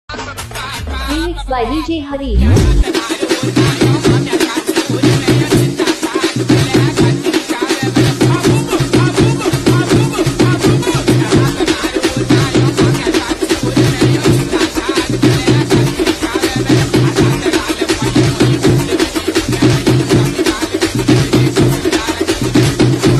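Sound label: gana background music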